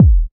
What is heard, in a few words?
warm kick drum f key; kick drum samples